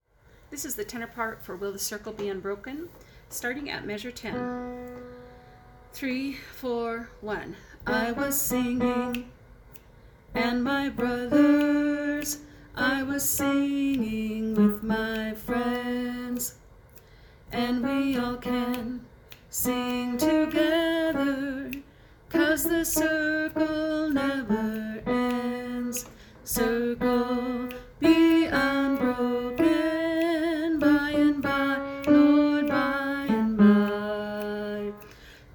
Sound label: Will the Circle Be Unbroken Tenor